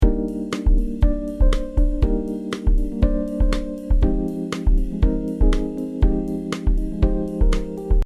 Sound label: BG faster